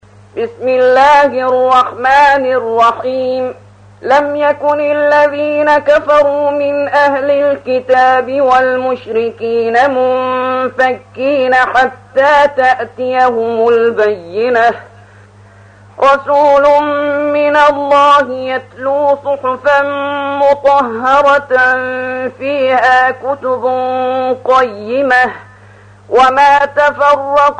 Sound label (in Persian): ترتیل سوره بینه